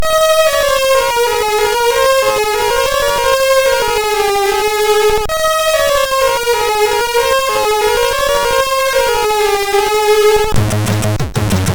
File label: Dynamical Waves, sid MOS6581R3; hvsc